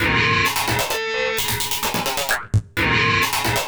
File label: Ugly, Industrial, Nasty